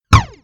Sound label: Laser Gun Sound